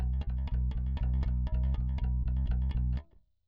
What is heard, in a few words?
bass